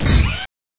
gunner run step

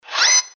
zoom in

